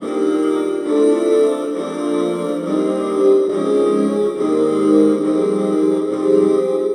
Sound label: Synth Progbuild; Sonic Foundry (Sony Creative Software); Knutrix Drum & Bass